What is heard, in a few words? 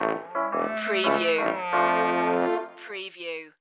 stinger